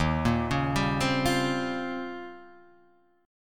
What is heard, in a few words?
D sharp 13th, Guitar, Half Step Down